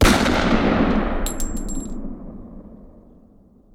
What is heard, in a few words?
Gunshot; games